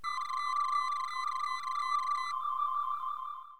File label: Tricorder; Star Trek; Federation